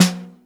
Bottom, Snare; Ableton Foundation FreePacks; Snare Designer

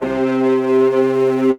Leadstack, P-50 Linear, Retro Synths; Ableton; Factory Packs; P-50 Structures

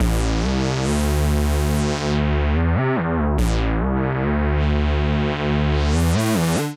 Fm Houz Bass A Minor 142 bpm, bassline; MIDI Clips; Tonal; Skitter and Step; Ableton Factory Packs

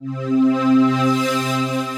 pad, synth pad

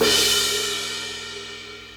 Ride Edge; Hybrid Kit; Drum Hits; Drumdrops Recording Hybrid Kit

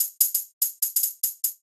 Tops 146 bpm; Skitter and Step; The Fear

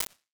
studio small; Ambience and Small Rooms; Ableton; Convolution Reverb

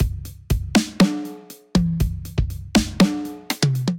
Arizona Kit, Drums Acoustic

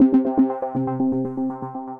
106 Live Key, Synth Rhythmic

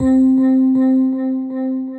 Atmospheric Spirit, Synth Keys

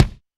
kick; Ableton Foundation FreePacks; Foundation Drums

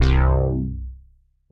ShineBoc Bass; Basses; Ableton; Creative Extensions; Poli

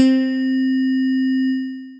Dreamy Bell, Mallets; Instruments; Ableton; Synth Essentials; Operator

